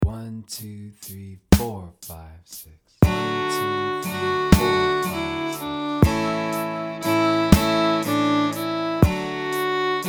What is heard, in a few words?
jig